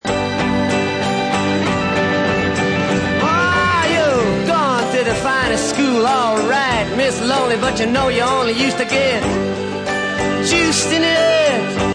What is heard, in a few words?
awww